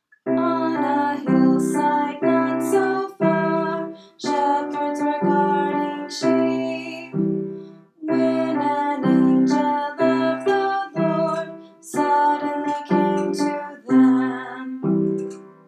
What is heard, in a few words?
recitative